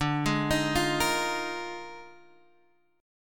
D sharp Major 7th Suspended 2nd Suspended 4th D, chord